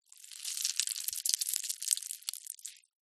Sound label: animals leach or worm slimy movement squelch; zapsplat